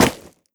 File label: step run, footsteps player debris